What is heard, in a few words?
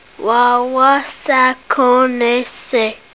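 lightning, weather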